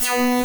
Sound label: DISCO ZAP, SYNTH PATCH; Soundengine - CSR-000 The Art of Transwaves